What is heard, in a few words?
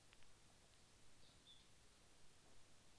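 Output Active Non Charge, amplifiers; OPA313; HPF background noise; Active Non Chage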